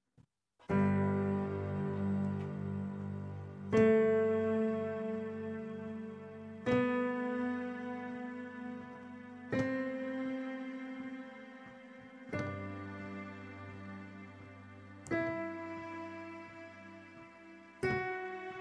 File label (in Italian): scala maggiore con il pianoforte